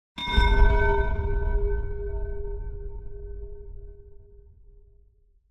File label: Impact Metal Moan Strong Pipe Hit - Low; Hollywood Edge; sound designer's toolkit v2